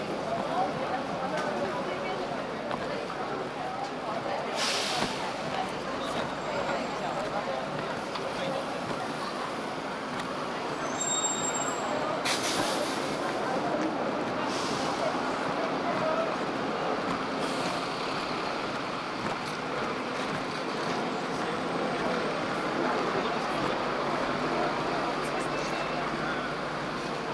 Royal Ave, Belfast; Sound Scapes

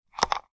Taxi Meter

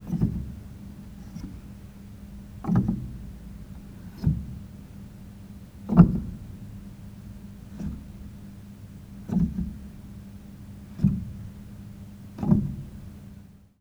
Hammer Rub, Piano; Piano Miscellaneous

